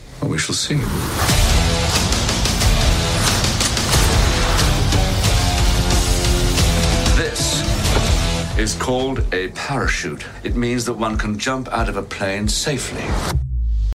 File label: cool english ringtone